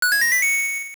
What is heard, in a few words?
Game Win; Winning a prize at the Trendy Game (also used when 4 Heart Pieces are combined)